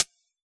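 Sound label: hi-hat FX, Yamaha DJX; 155 DJX Kit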